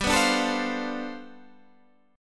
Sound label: G 11th, Guitar, Standard; G11 strummed